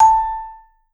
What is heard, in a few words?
Vibes; Sampleheads - Dave Samuels Marimba & Vibes